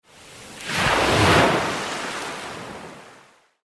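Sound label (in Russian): ударяющая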